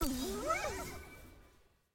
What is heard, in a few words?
button click; milio